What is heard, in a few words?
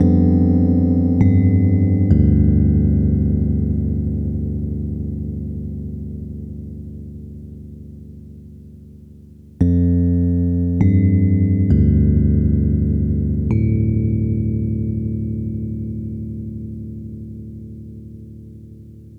E Piano, hip-hop; Sonic Foundry (Sony Creative Software); Hydroponic Hip-Hop; Keys Synth; Pianos